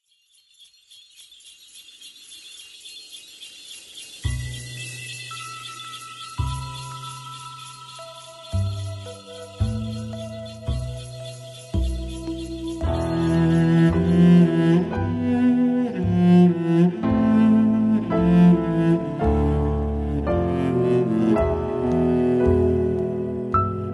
violoncelle

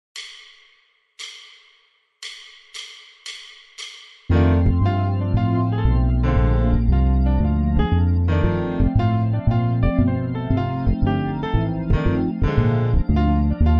La batterie ca me branche

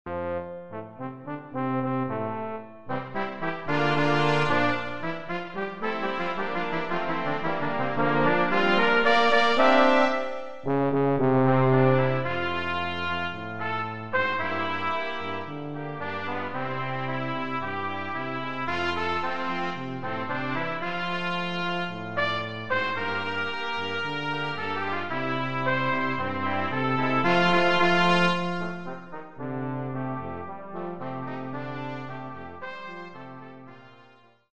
le cha cha du chat quatuor cuivres

